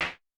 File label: CLAP, Vermona Mono15 Drums; Stereo layers; blend with snare